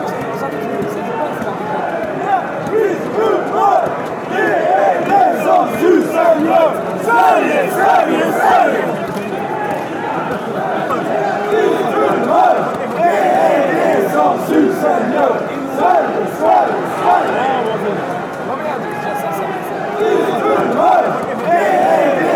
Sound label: go sweden healthy mood, fanchants